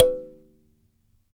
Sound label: Dyna Sansa, Africa; Roland LCDP11 Africa VOL-1; Sansas